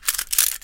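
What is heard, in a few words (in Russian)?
быстрая подготовка оружия перед боем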